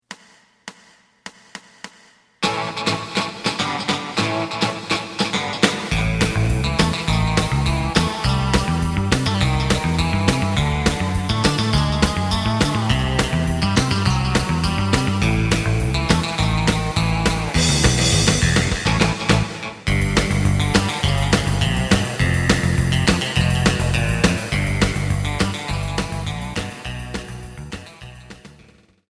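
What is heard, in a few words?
side guitar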